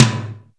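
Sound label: Roland R-8 hollow tom; Power Drums U.S.A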